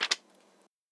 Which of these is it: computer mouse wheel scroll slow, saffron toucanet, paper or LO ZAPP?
paper